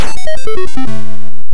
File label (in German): sterben; commando